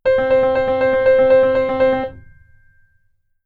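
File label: keyboard tremolo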